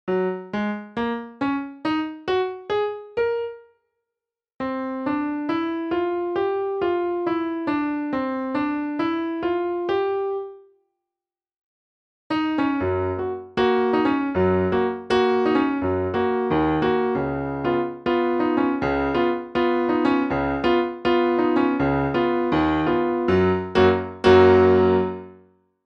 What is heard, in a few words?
Keyboard Piano